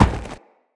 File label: Crewman Footstep Walk; Characters; Corpus; Crewmen; Footsteps